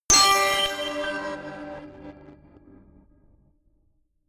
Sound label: notification glockenspiel crazy fifth